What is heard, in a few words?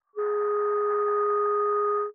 clarinet Filtered